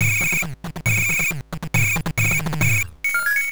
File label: March (Stage Clear); Mr. Heli no Dai Bouken; PC Engine